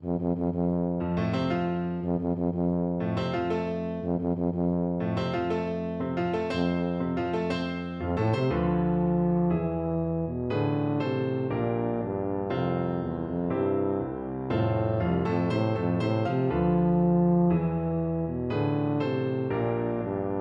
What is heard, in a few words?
mendelssohn wedding march, tuba